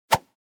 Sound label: Fast swish